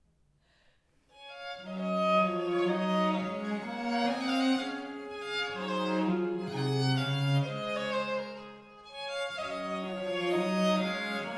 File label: Arrangement for two violins, viola, and cello; ninette a la cour